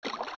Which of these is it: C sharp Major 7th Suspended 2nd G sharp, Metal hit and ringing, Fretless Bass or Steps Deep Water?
Steps Deep Water